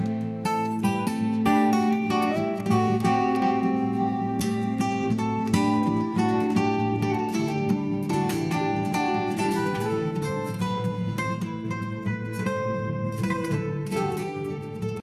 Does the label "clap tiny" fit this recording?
No